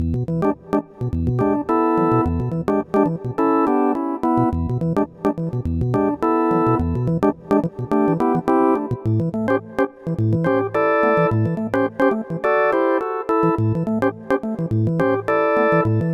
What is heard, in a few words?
Vox